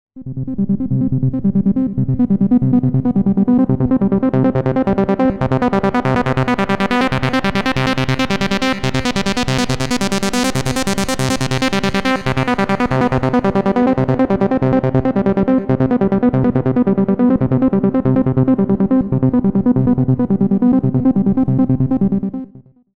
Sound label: MOS6581 Melody; SIDizer